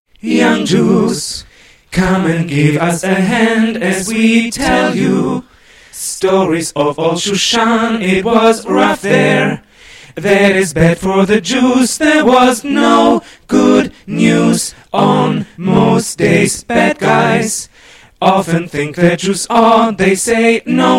all voices, choir

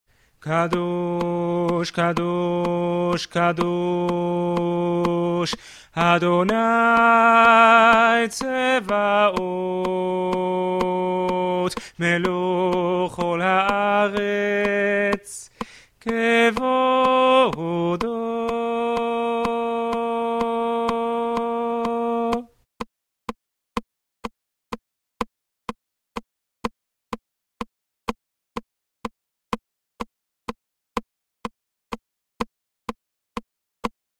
kdusha bass, choir

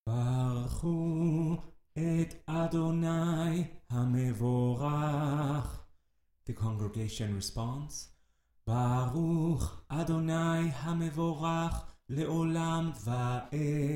blessing before torah reading

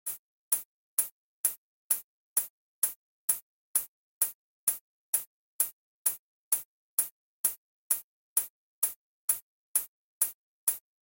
al hanissim bass, choir

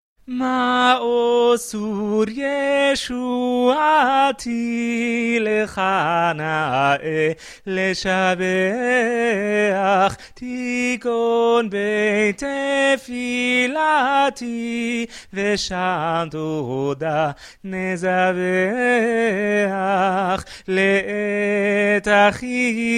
tenor, choir; Maoz Tzur

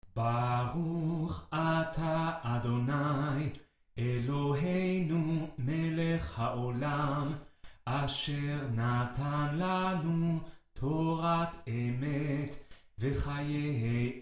blessing after torah reading

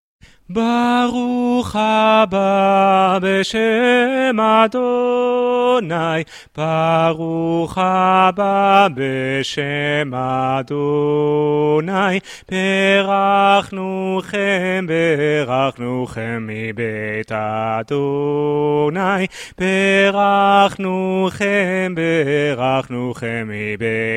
bass, choir; baruch haba

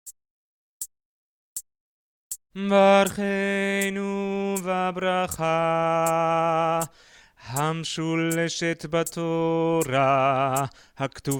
priestly blessing alt, choir

